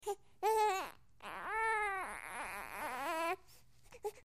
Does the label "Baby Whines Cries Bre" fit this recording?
Yes